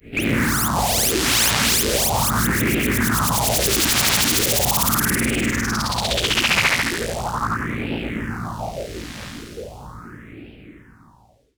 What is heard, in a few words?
Roland E Noise, Roland S100; musicradar-synth-samples